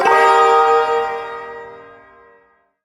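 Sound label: Ersatz Shawzin Pentatonic Minor Chord J; Warframe; Duviri Ersatz Shawzin; Chords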